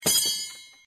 crowbar switch; weapons

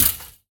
fence destroyed; hits

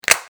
radio switch; weapons

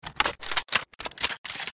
cz75a reload; weapons

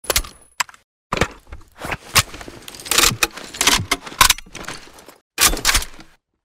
negev reload, weapons

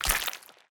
bleed

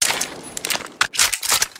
saf 200 reload; weapons

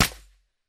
acr fire; weapons

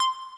arrow ping; pings

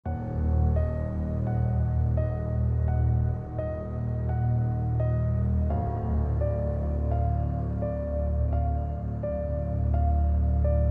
menu music halloween

